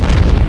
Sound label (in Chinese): 爆炸音效; 飞机大战